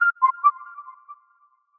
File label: Notice, notifications; Vivo Y1